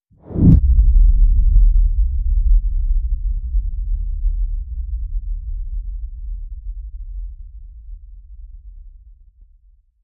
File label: Hit Low Stealth